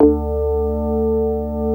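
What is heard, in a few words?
CX3 organ; USB Soundscan vol.09 - Keyboards Old School; AKAI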